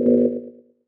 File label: mouseover command; allegiance